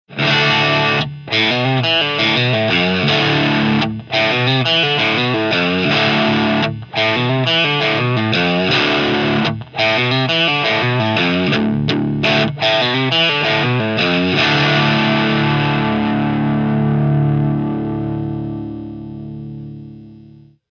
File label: strat bridge single, guitar